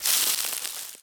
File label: leaves step; Golden Crayon Sounds